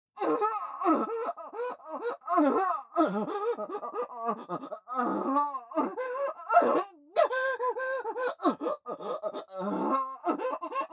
オホ声; レイナ（姉）

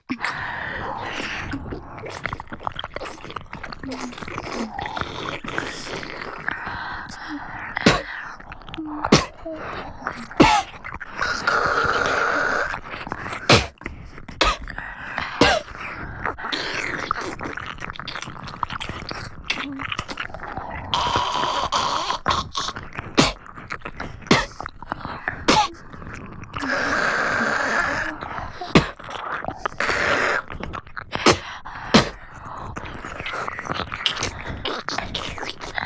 唾吐きループ ペロペロのみ, ASMR

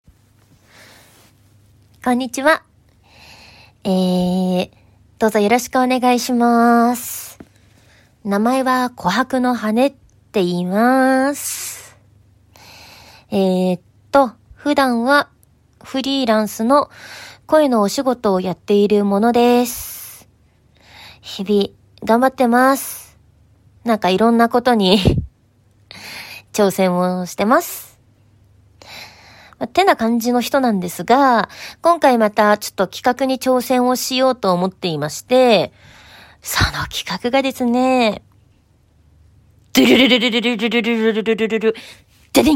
おならASMR 大人のお姉さんのおなら100連発 うんちとおしっこもあるよ

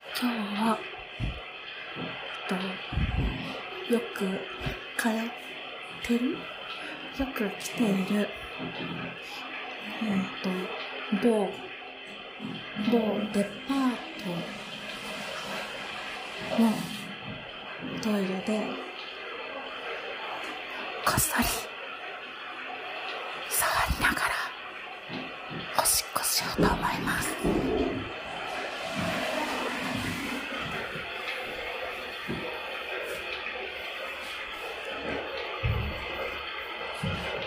デパートのトイレでオシッコ; 某デパートのトイレ

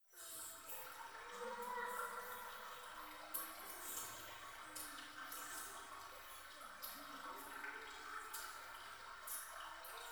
トイレ イラマチオ 川のせせらぎ

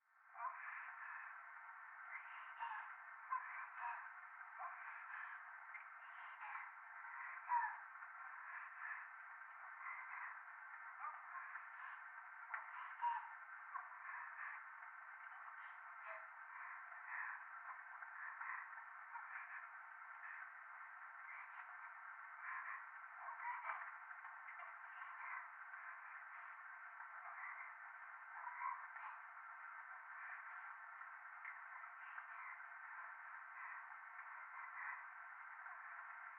トイレ 右耳 密着 処女 喘ぎ 雨

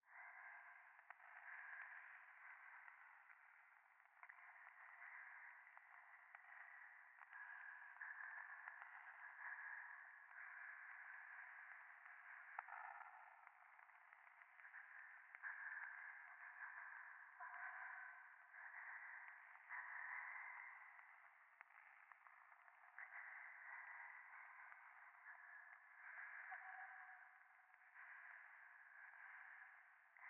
右耳快感吐息 暖炉

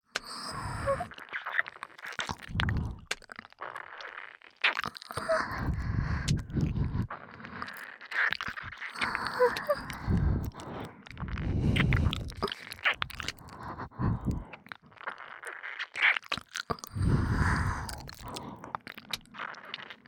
(両耳) 吐息たっぷりまったり耳舐めエッチ, ASMR; 白耳; パンパン系効果音版

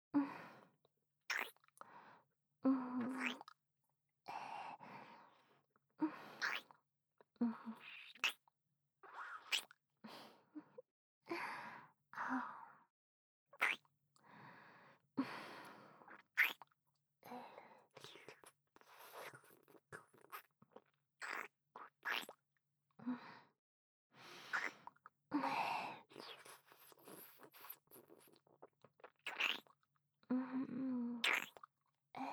キス, ASMR

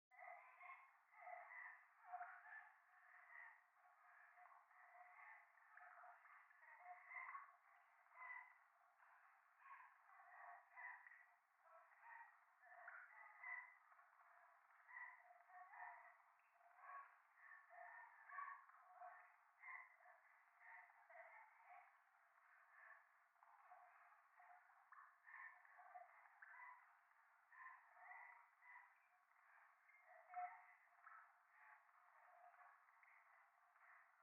夢の中 喘ぎ 雨上がり

